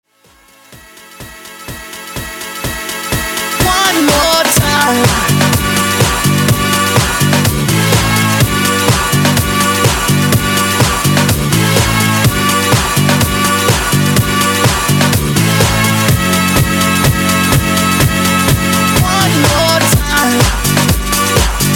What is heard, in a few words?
90s Tribute Refix 125 Bpm